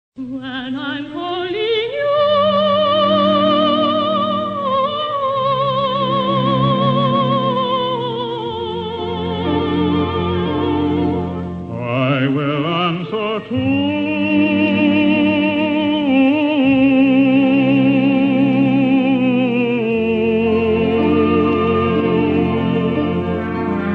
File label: ringtone